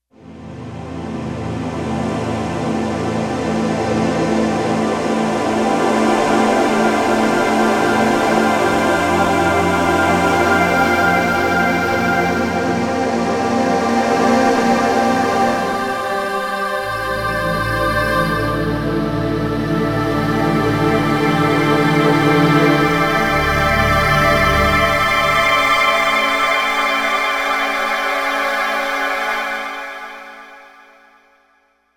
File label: vox ensemble; Digital Synthesizer